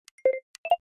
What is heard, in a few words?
call mute unmute; Системные звуки и уведомления iOS 18 (iPhone 16, 16pro, iPad)